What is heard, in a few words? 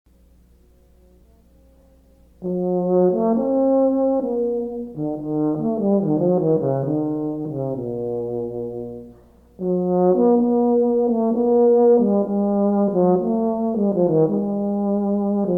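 euphonium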